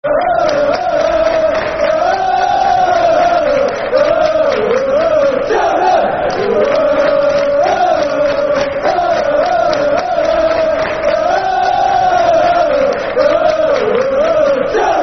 Der Cheltenham, Football Chant; Soccer Songs; Cheltenham Town Ctfc

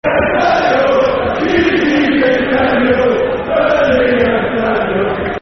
Football Chants; Queens Park Rangers Qpr

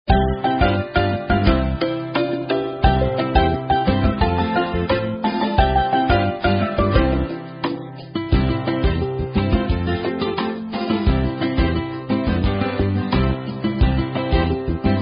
Karaoke Songs; Girl Scouts

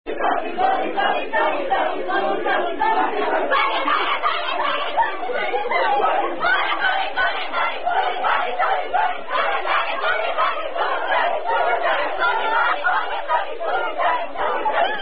children's game, Korean; Bunny Bunny Non-Drinking Game

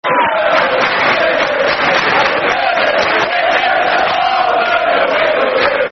Football Chants, Bolton Wanderers; We Love You Vernon, We Do; Bolton Wanderers Bwfc

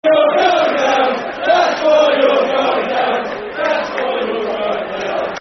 That's Why You're Going Down, Football Chants; Portsmouth; Portsmouth Pfc

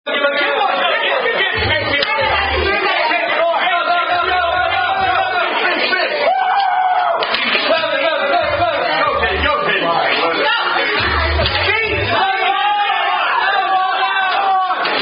Speed Moose, Drinking Game

